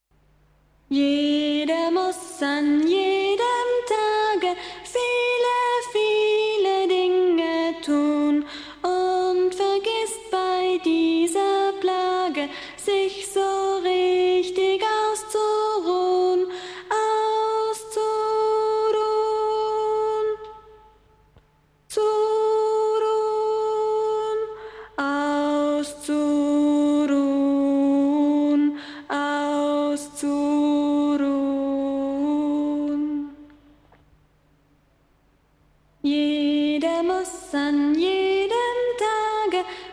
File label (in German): Ein Lied um sich auszuruhn Nur Gesang; Playbacks fur Kinder